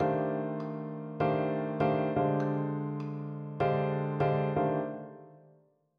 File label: blues; improve your blues playing